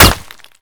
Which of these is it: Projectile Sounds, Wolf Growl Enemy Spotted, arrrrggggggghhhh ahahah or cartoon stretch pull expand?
Projectile Sounds